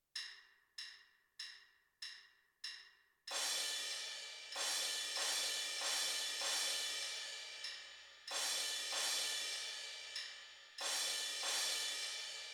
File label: suspended cymbal